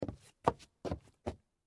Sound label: steps wood; baileywiki maps premium towns